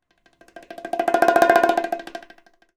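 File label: amb djembe, ambient percs; Univers Sons; Basicussions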